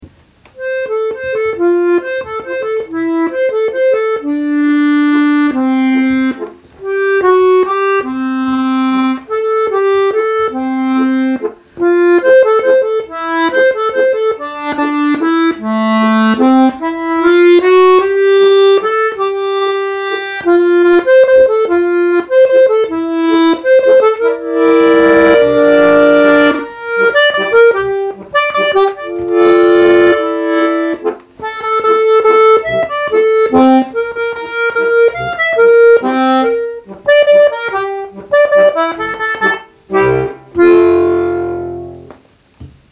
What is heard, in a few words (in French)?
Musette